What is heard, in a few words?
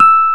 RH, Rhodes; Greytsounds; CLASS RHODES